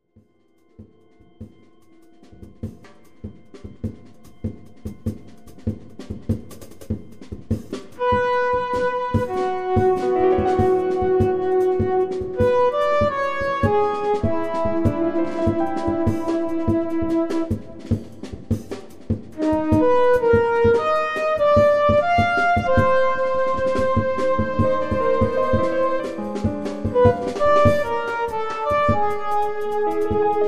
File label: modern jazz